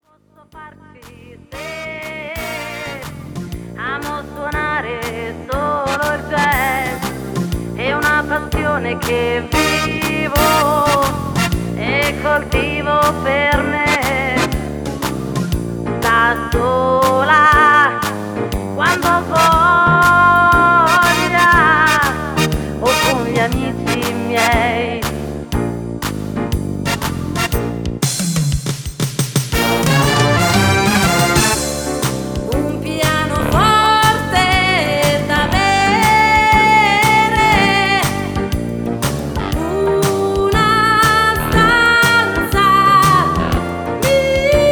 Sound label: Jazz; brano Jazz cantato